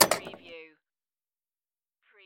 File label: Pinball sound, bumper ka-klunk with roll